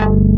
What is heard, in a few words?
PULSON BS; Pro-Rec - Synthsonic; BASSES